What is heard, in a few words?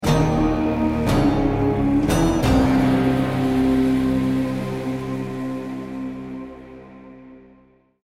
lost round, music; hades